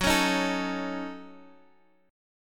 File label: F sharp Minor 6th, Guitar, Drop D; chordshape; down